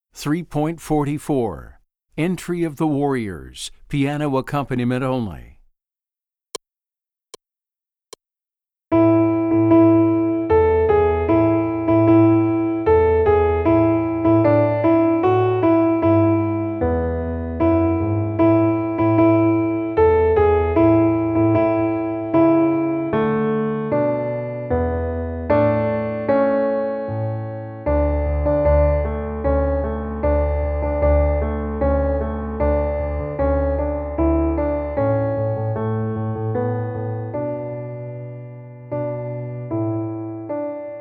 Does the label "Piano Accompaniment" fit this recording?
Yes